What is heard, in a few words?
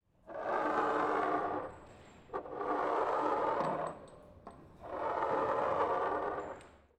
30ml Glass Bottle Moving Across Wooden Table